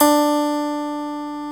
HARPSICHORD D3; Greytsounds

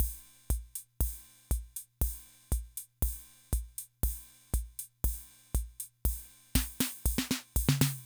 Loop, rhythm 55, classic machines

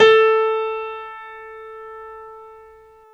PNO, Grand Pno, Roland; Club-50 - Foundations Roland

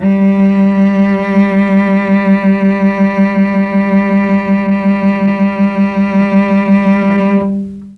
G2 300 CELLO, Mellotron, STRINGS; Mike Pinder - Mellotron Archive CD

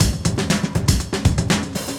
breaks, 120bpm; free bespoke breaks; BeatStyleG

